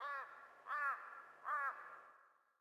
sting crow; Rust

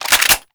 wp foley winchester reload pump; Call of Duty Modern Warfare

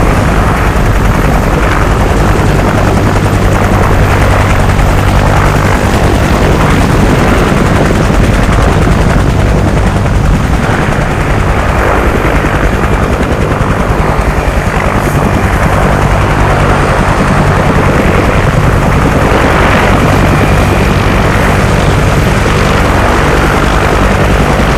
cobra move loop, vehicles; Call of Duty Modern Warfare

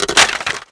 fence light wood; World of Tanks Blitz

